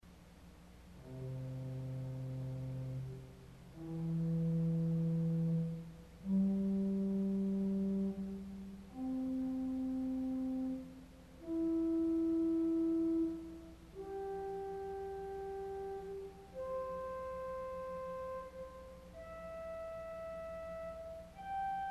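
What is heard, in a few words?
Flute Triangulaire 4 Arpeggio, organ stop; CulverAcademy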